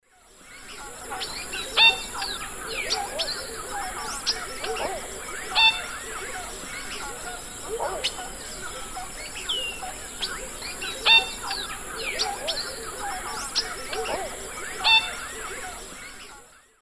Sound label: Tachybaptus dominicus, call; S. America; Eastern Lowlands